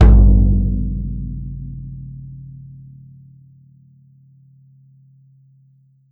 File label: drum, mixed drums, World Instruments